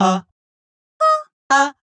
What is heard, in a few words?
Two Way Velocity (G-flat Maj Pentatonic), Harmonic Vocal Racks; Instruments; Ableton; Factory Packs; Voice Box; Complex Vocal Racks